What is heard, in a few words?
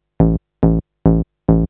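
SYNTH BASS DANCE 140 A; eJay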